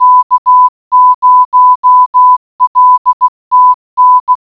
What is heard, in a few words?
CW; K0LTN